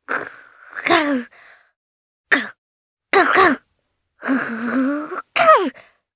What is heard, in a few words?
Hum, jp; kingsraid; voices heroes jp